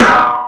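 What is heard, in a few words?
snare drum; Zero-G; Chemical Beats; SNARE ZOO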